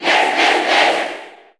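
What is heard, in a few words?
Cheer Japanese, crowd; Ness; SSB4 SSBU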